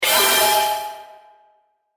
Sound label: Reverberating Dungeon Spell; Magic Sound Effects; fantasy sound effect